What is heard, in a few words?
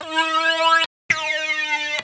groan; groantube